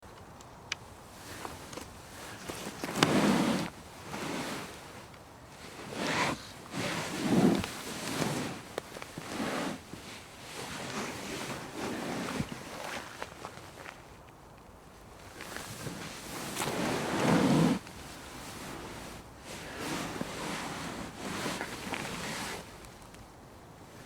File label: shifting on couch series